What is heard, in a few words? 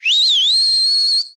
Whistles; Link